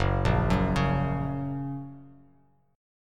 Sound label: F sharp Major, Bass Standard; arpeggiated; JGuitar